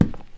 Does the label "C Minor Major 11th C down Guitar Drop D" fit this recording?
No